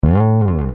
guitar

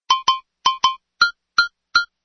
drums

